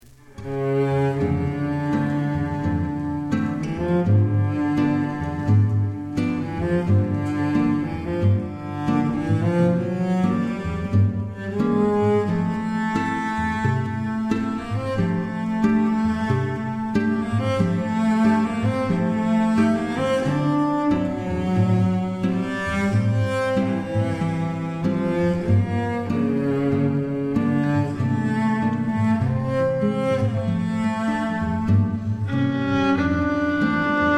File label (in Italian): trio Jazz